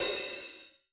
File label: cymbal drum sample D key, Royality free cymbal sound sample tuned to the D note.